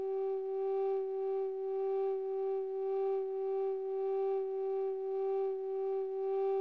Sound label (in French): tuyau ouvert